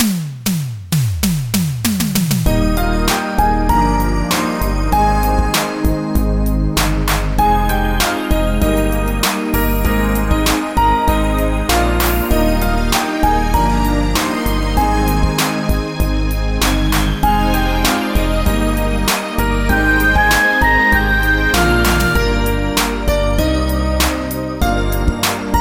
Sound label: Guitar Music